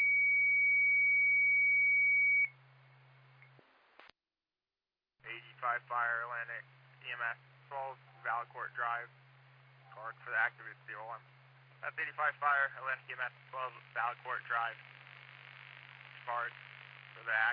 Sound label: Siren; Lafayette FD